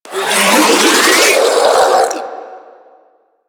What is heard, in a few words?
Melee Mid Spin Scream; Warframe; Infested; Ancient